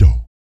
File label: PERC, Vocal, Jazz FX, BASS PERC